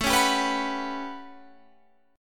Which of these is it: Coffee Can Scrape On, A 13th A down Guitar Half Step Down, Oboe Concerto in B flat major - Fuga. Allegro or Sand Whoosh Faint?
A 13th A down Guitar Half Step Down